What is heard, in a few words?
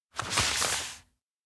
book open; anemoi